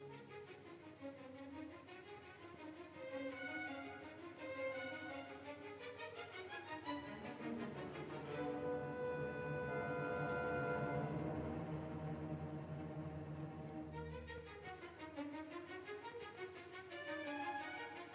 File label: Orchestra Symphony No 6 in F Major Op 68 Pastoral